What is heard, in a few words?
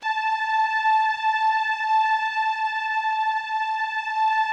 A4, STRINGS, Yamaha VP-1; Music Store; JODYSTRINGS